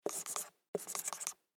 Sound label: Dry Erase Marker Write On White Board Short; Office; Erasers